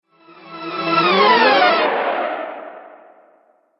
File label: Cinematic Whoosh Flyby